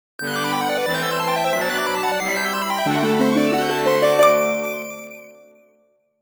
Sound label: Get Sword Fanfare; legend of zelda the link's awakening